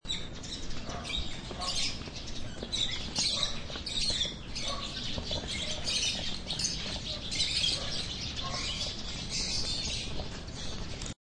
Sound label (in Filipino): ibon